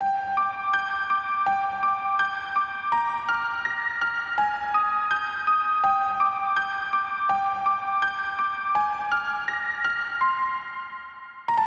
piano vstuplenie

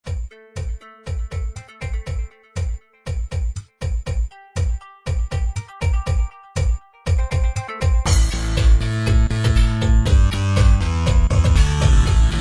techno

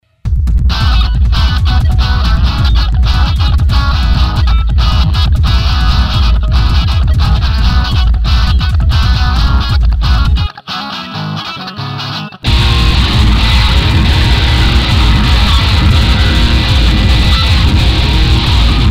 instrumental

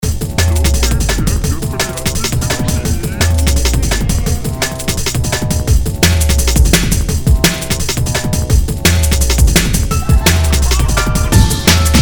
dnb wersija instrumental

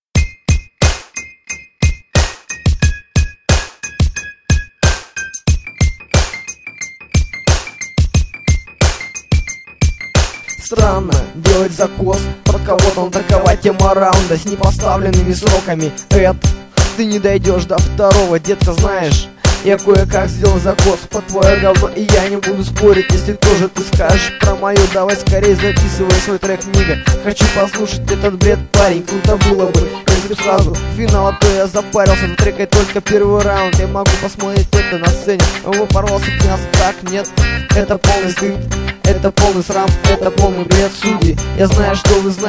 beat

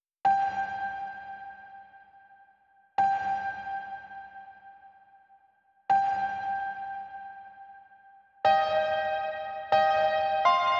piano kuplet

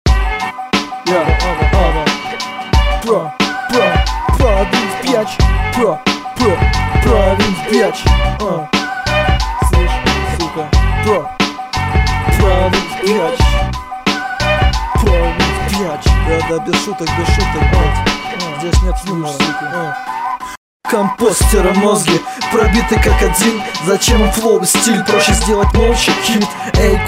slake drum

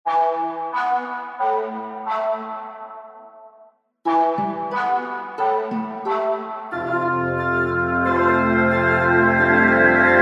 girl with a horn

